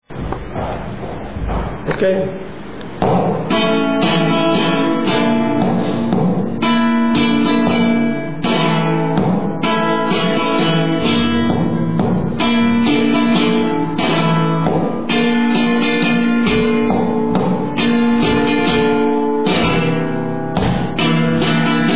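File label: Bulerias, flamenco guitar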